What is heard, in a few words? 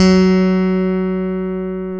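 Chapman Stick, bass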